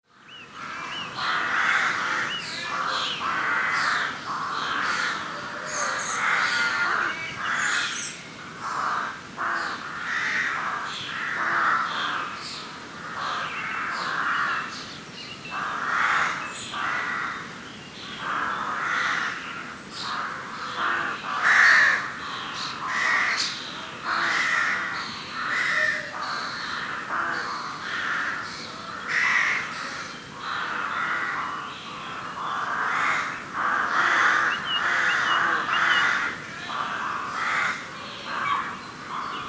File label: birds meadow crows